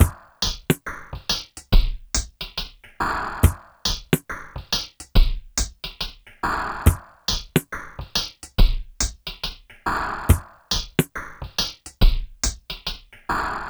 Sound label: Filter Loop, Drums & Percussion, Electronica; Sonic Foundry (Sony Creative Software) - Electronic Point Blank